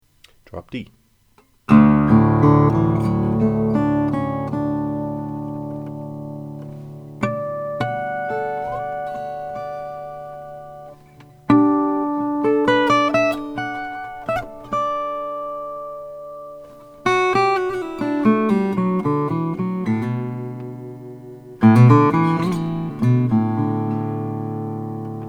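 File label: rainsong graphite guitar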